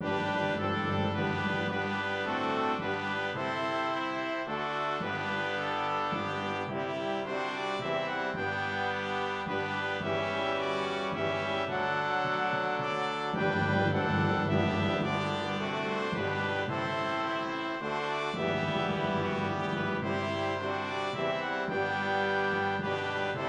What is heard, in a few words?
Hymn BRASS